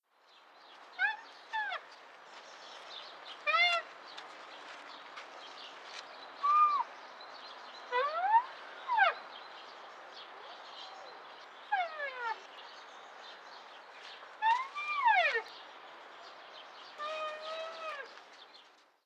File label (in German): Breitmaulnashorn Weibchen; Guenter Tembrock